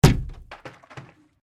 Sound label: Cardboard box hit